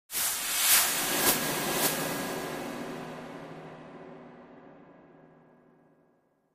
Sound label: Cymbal Strike Brushes High Tone Whistle Impact Type 4 - Triple; High Thin Impacts